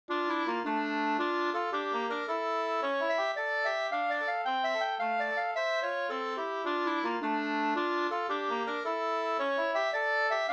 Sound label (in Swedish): polska triolpolska